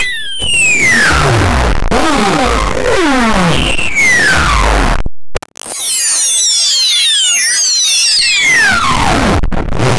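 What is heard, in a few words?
children crying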